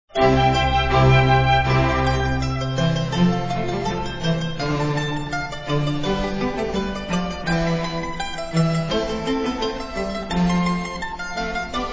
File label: Mandolin; Vivaldi